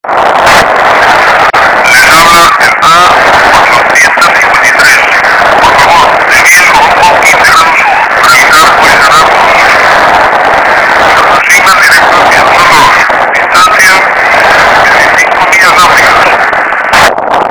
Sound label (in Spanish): barco sucio